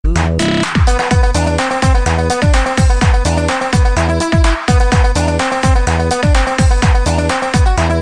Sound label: Funny Music